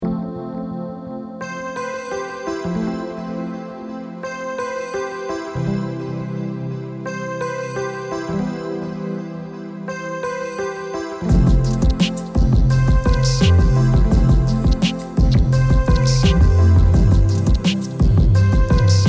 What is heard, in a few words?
New Age